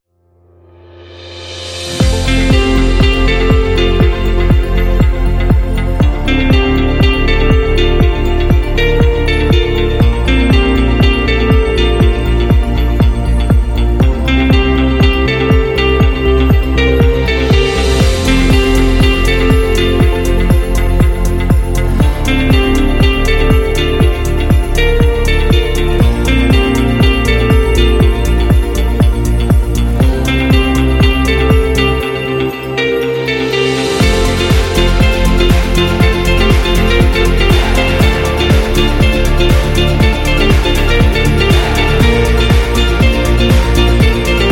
University Corporate

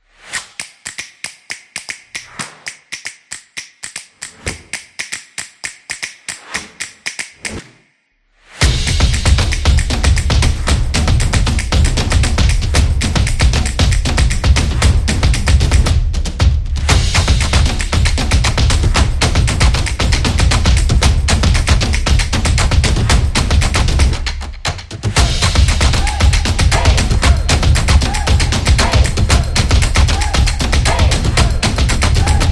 This Is A Drum; soccer stadium

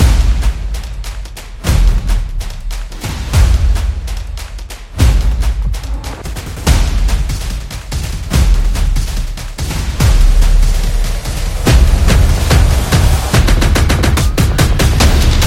Tribal Drums Action; epic drums

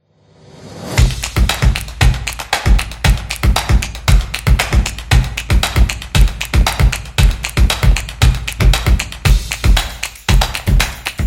A Percussion Drum Beat

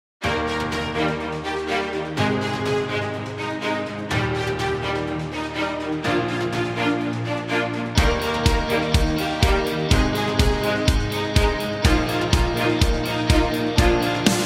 Commercial Epic Rock, happy music

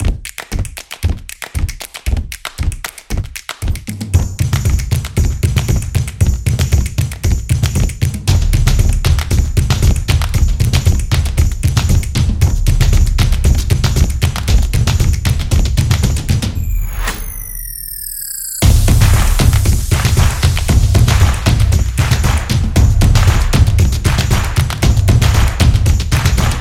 Percussion Upbeat Solo

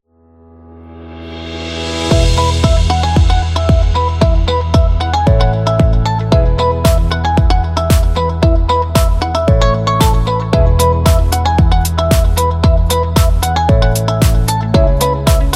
Corporate Intro

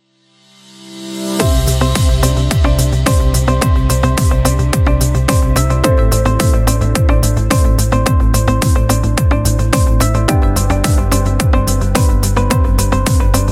Corporate Inspire

